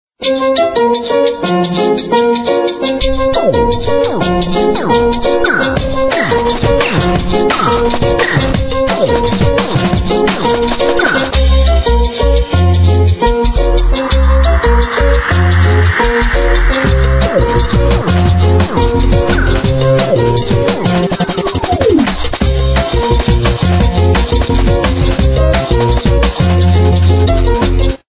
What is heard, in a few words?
Riff; Motorola; V80; China; MTV